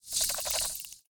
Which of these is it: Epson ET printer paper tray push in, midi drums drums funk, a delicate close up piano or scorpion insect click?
scorpion insect click